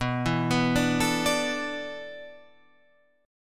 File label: E 7th Suspended 4th